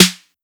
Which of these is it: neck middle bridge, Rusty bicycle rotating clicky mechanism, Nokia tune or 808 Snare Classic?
808 Snare Classic